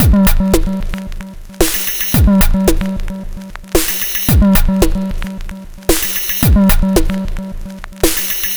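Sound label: drum, 112bpm, Breakbeat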